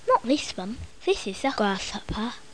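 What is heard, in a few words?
grasshopper; Little Red Riding Hood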